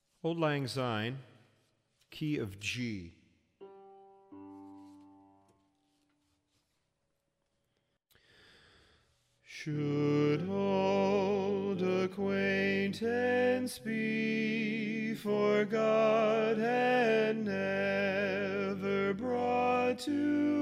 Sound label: Auld Lang Syne lead, Grand Chorus